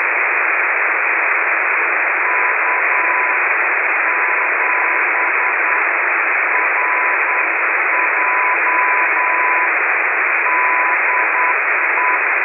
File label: mcw exchange unfiltered; optical comms